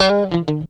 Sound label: GUITAR, Funky GtrLicks; E-MU Formula 4000 Series Vol. 1; Hip Hop Nation